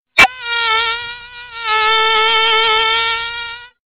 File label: mosquito tone; animals